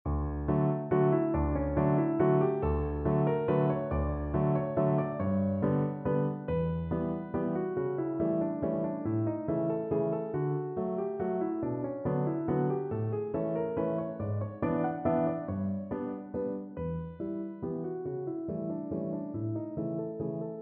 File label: PNO